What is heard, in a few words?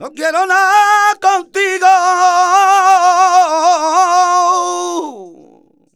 voc f, Flamenco Vocals Male Cantaor; Male Cantaor 1; Best Service ProSamples vol.46 - Flamenco